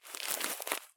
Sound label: turn grass low; Escape from Tarkov